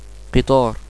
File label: kitar